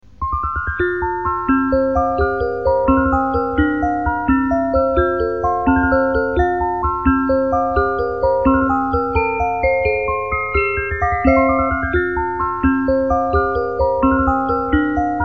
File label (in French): reuge valse fleurs, boite a musique